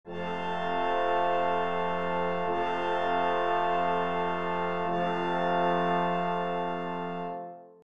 brass

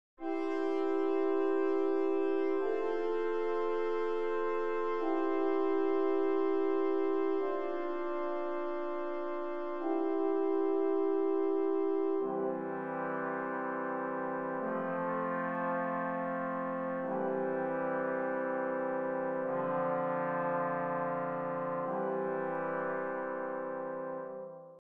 4 part brass

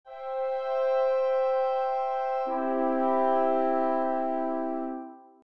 woodwind threes

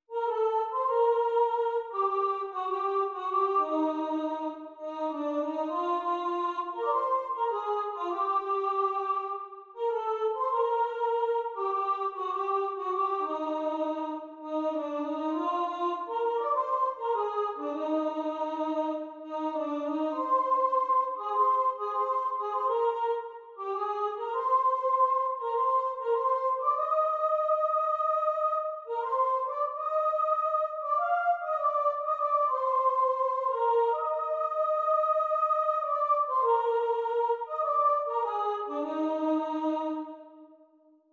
music mexican

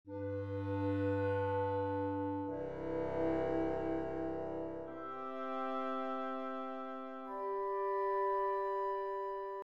woodwind

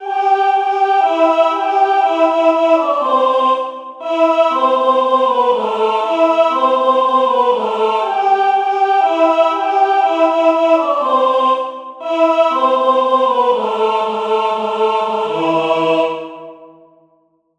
ojibwa dance; squaw dance

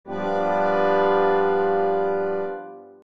brass

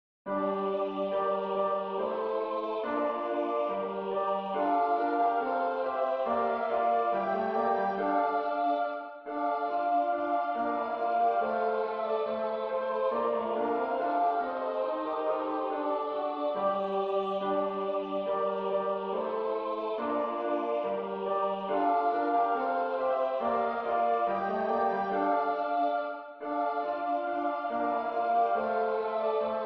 madrigal piano

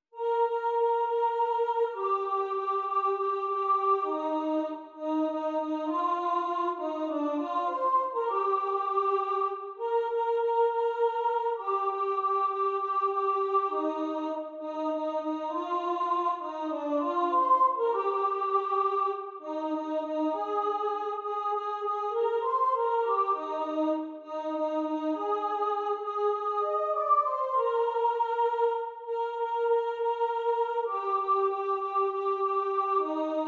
music german

